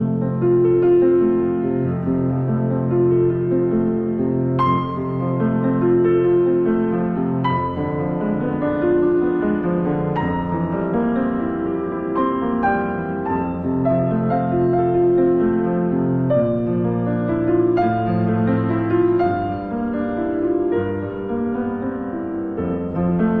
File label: piano, Nocturne in F Major